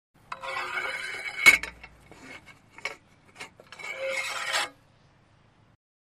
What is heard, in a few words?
Worming A Cannon